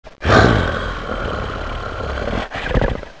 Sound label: growl